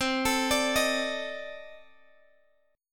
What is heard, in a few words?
C Minor 6th Add 9th C Guitar Open F; Cm6add9 arpeggiated; x,19,21,21,18,x